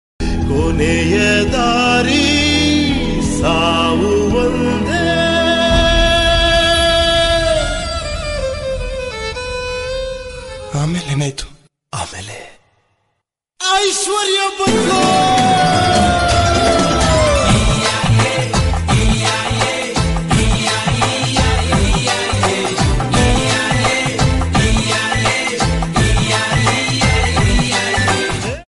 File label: song Kannada